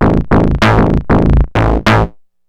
Boogie Bass, Synth Bass Loops; Sonic Foundry (Sony Creative Software); Groove Elements

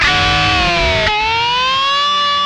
whammy fx, guitar

